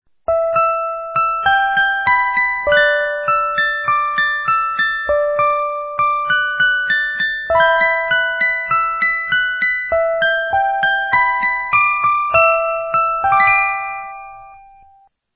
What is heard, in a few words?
musical mechanism; sankyo